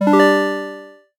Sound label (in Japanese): 通知